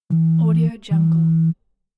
vibrating phone

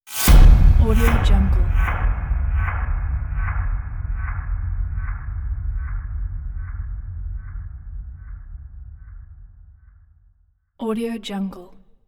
heavy echoey ripping impact

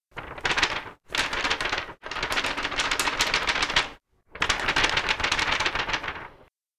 paper fluttering sound

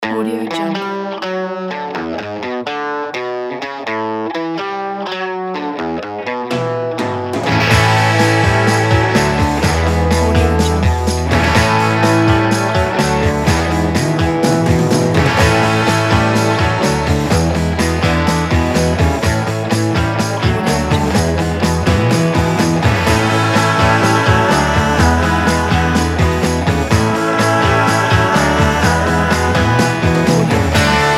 60s flowerpower rock

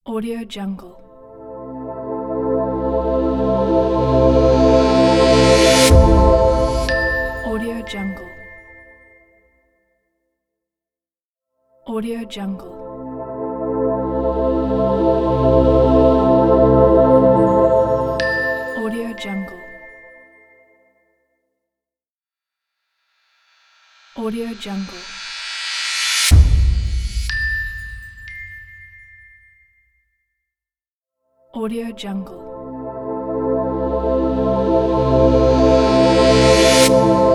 corporate logo reveal

